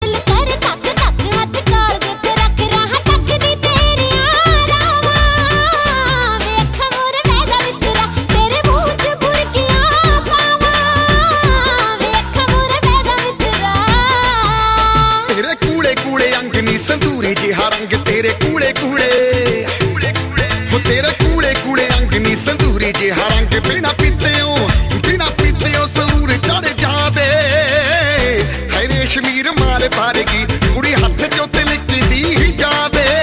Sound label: Mobile tone